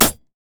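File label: siege rider basic attack; minions; LoL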